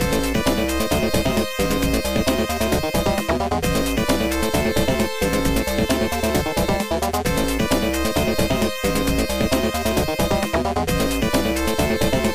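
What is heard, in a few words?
background music; Street Fighter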